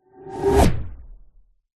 magic spell whoosh powerful energy pass electric

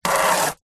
household water spray short fast plastic tub hose; zapsplat

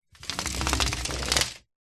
household venetian blind wooden hand run up slats; zapsplat

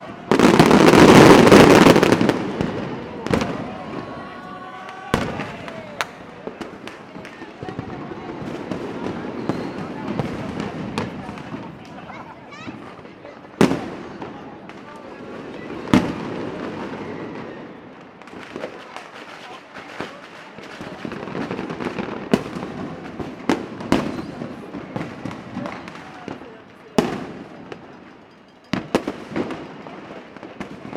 fireworks mixture urban; sound effects one

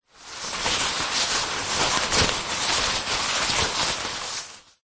foley umbrella handling; zapsplat

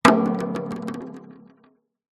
CARTOON PERCUSSIVE Percussion; smartsound

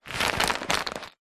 batteries aa many pick up scoop from carpet; foley; zapsplat

